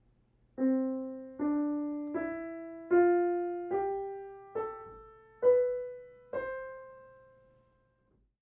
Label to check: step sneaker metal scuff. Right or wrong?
Wrong